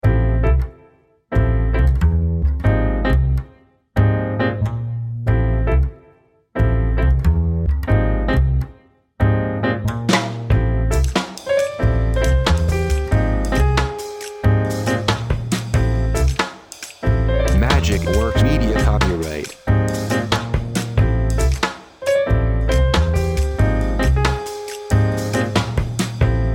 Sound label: jazz waltz trio